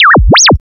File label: SYN-FX